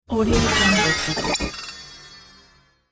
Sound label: digital glitch mechanism